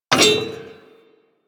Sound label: Bullet Impact Debris Pipe